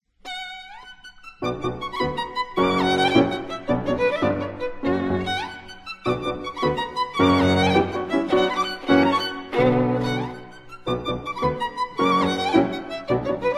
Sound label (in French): Concerto pour violon et orchestre, Violon; Concerto no2 pour violon et orchestre; La campanella; Nicolo Paganini